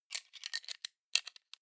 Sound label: plastic frame small mechanics rattle